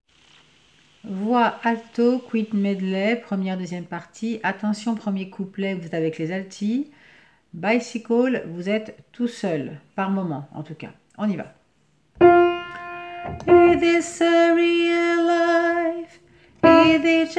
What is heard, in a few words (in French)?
voix alto